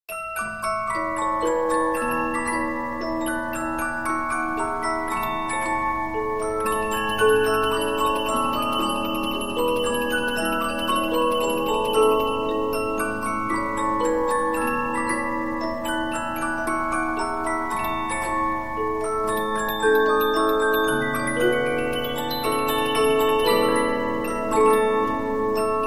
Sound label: Polyphon, 15inch disc musicbox